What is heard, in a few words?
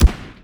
Deagle